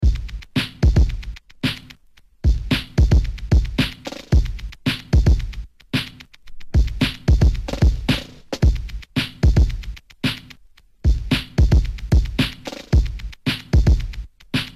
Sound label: Breaks and Beats loop